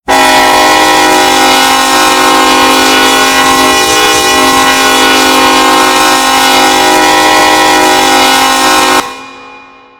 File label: k5lla, horn; run 8 v3 horn pack